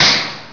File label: Kickout Out; Crystal Caliburn™ DEMO